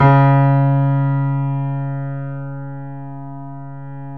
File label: PIA GRND, Roland